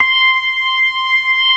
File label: ORGAN, C3 PERC; Keith Emerson Signature Series